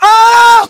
scout pain sharp

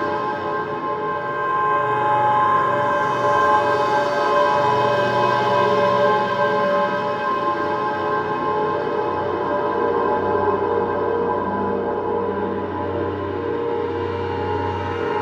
ambient, music; scp-178-1; slender

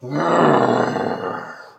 idle, male zombie; nmrih; zerosiki; slender

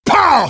jump; freak fortress 2; starboard

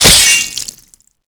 wep hit; tm ventrilo; slender

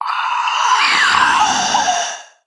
female zombie sighted; nmrih; zerosiki; slender

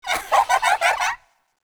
chasing; chiabihamaru; cv project; invading executioner; zerosiki; slender

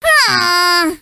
stabbed; freak fortress 2; hat kid

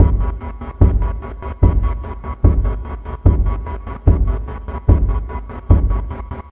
chase music hard; itsteals; legs; slender

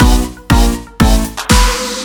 failure; tf2ware ultimate; music game

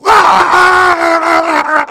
sandwich eat

